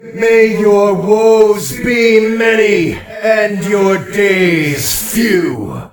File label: win; ff2